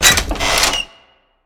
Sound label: Backdoor Open